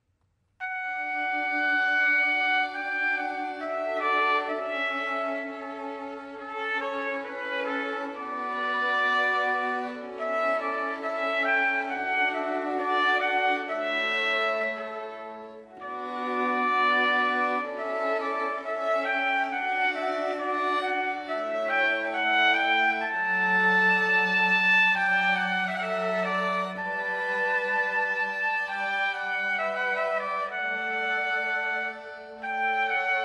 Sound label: String Quartet 1 Trumpet; La Grace; Telemann; Classical